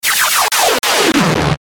Stutter Laser Descend, Stutter Transmission Ascend